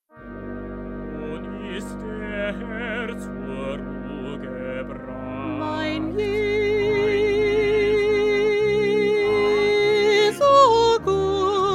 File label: Soprano